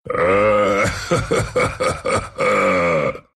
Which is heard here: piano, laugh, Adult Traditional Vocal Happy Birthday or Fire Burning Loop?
laugh